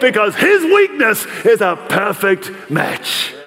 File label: Vocal Phrases; Vengeance Samples; Vocals